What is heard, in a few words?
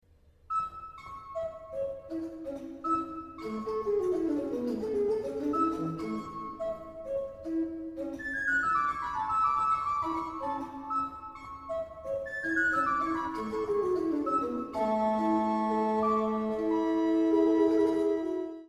Toccata con lo Scherzo del cucco; Pasquini; Il Santo Natale